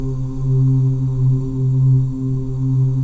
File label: BGV OOS; Spectrasonics; Symphony of Voices